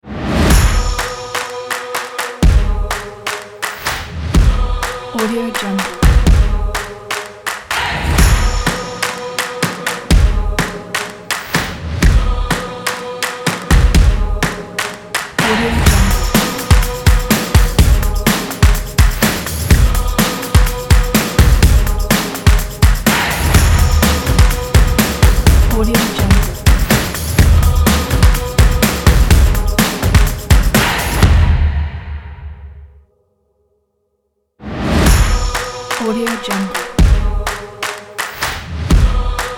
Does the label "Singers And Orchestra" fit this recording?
No